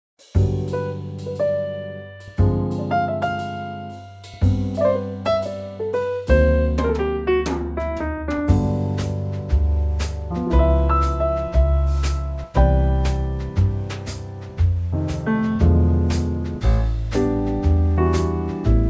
Trio; key - G - vocal range - F# to G; 2019 Trio arrangement of a classic standard.; slightly faster in tempo

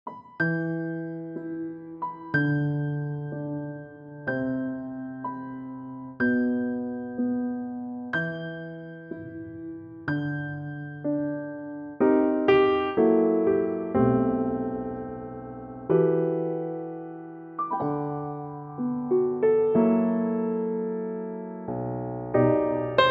Em piano; vocal range - E to D; Stunning piano only intimacy

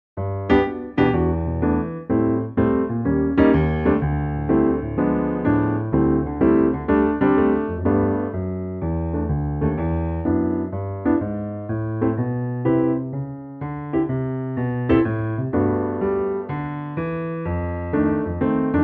Piano G; key - G - vocal range - F# to A; Intimate piano only arrangement of this quirky jazz favourite

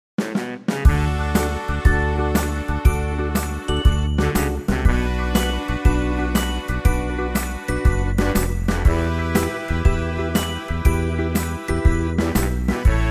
swing; D; key - D - vocal range - A to B; Swing arrangement

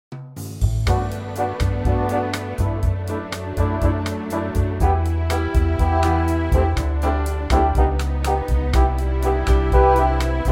orchestral bossa G; key - G - vocal range - D to E (optional G top note); Lovely orchestral Bossa arrangement

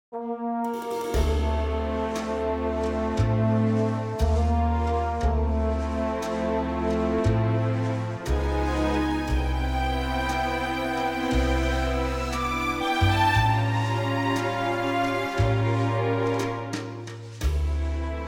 C orchestral; key - C - vocal range - D# to Bb

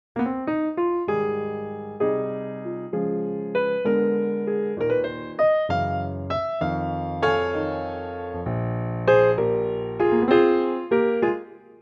Ab piano

